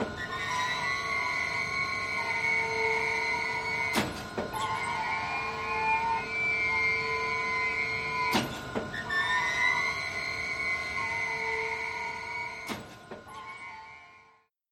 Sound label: factory; analyser samples